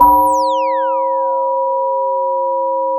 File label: pop, Sound of a church bell